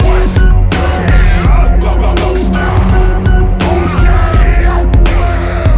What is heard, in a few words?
hiphop (Growl), ringtones; Google; NewWave Labs